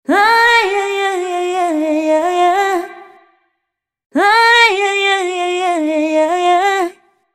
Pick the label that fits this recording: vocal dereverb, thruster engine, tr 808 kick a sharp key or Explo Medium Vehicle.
vocal dereverb